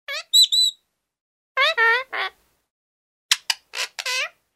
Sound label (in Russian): malenkij popugaj